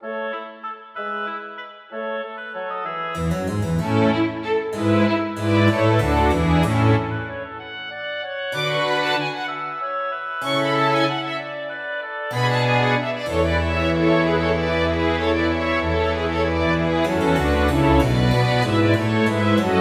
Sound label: Suite Orchestra Courante